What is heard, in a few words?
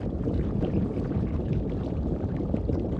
spin reels; Great Book Of Magic Deluxe; Wazdan